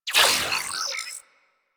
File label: Ship Advert Hologram On; Ambience; Props; Warframe; Corpus Ship Remaster